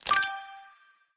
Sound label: new Best; tntbomb